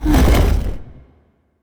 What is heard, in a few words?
Stone Move; helltaker